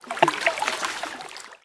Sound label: well slosh